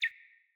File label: Cardinal Male Bird Single Chirp